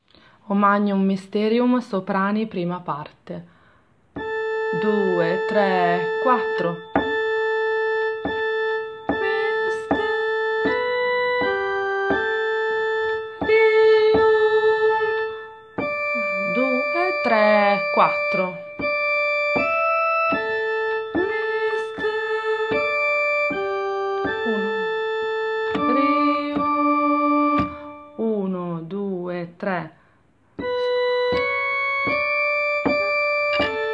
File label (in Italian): soprani; O Magnum Mysterium